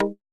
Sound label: pluck